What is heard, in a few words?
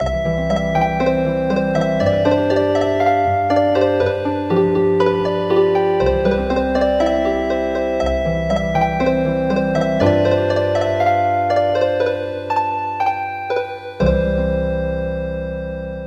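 Healing Harp, traditional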